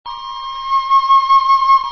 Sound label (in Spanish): Flute